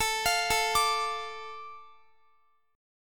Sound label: A Suspended 4th Sharp 5th, Guitar; Asus4#5; arpeggiated; x,x,21,22,21,22